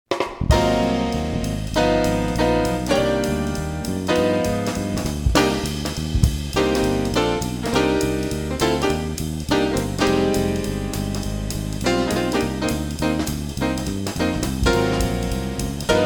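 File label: C Minor Blues for Saxes and Brass; for Saxes & Brass Auditions; jazz audition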